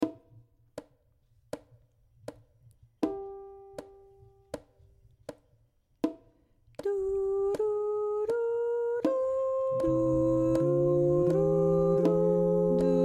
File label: Tenor Mix